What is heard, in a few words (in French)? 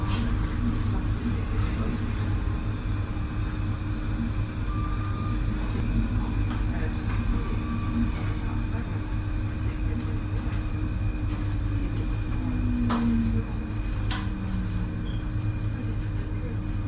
freinage, Metro